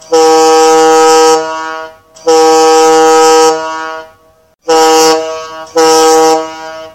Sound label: Two Prolonged Blasts Followed By Two Short Blasts